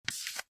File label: Book turning page